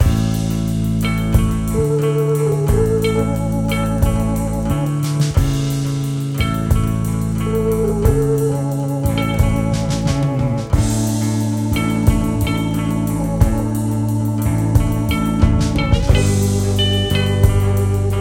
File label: Cutscene; Hip Stir Café; Cooking Dash